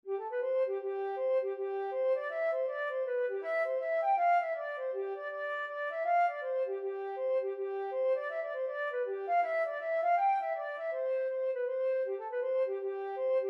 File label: flute